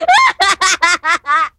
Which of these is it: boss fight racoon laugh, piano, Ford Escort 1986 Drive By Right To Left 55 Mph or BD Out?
boss fight racoon laugh